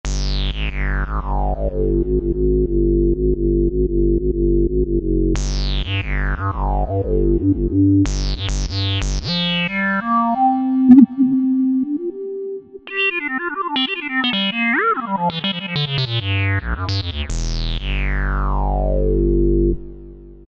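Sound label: Acid bass; Factory presets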